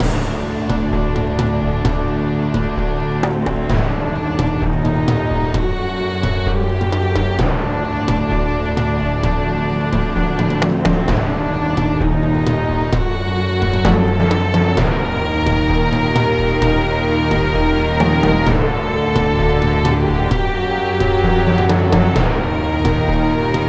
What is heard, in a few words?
Desert Level loop; Rezrog; Depard; Gems of Rezrog